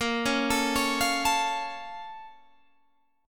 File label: A sharp Minor Major 7th, Guitar Open G